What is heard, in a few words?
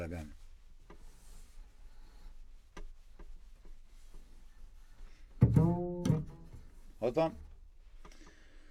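Contrabass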